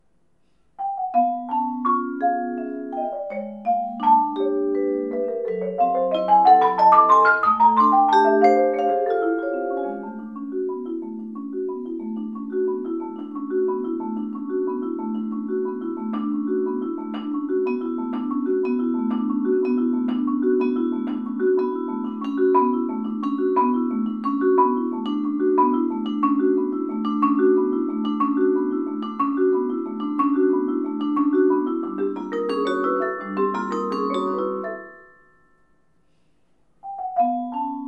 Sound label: Cross Hatch, percussions